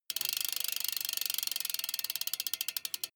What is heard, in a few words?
bmx bike freewheel push and slow down short